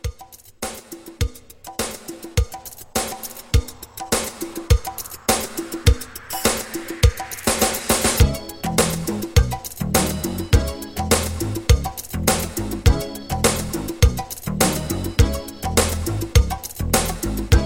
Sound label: 80s Re Drum 103 CLEAN